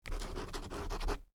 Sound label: Writing signature with ballpoint pen